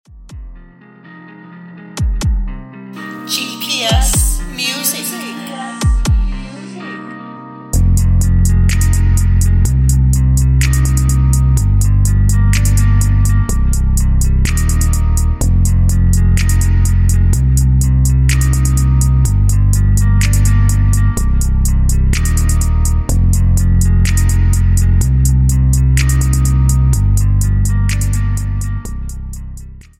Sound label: Heart beat